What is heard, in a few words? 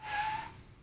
Car stop; o carro para, desloca-se para trás e produz o som CarStop; Quando pressionada a tecla S ou Seta para a baixo